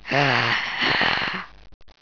mummy alert

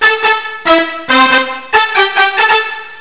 brass conclude; kart race

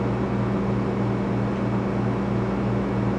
biolab hum loop

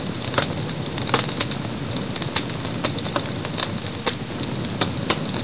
fire; milan

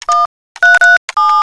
keypad; cs jackpot v2

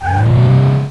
train start

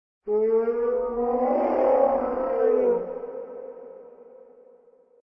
Dino Distant; docks

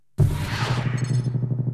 grab

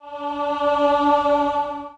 teleport

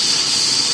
steam; dm achluophobia

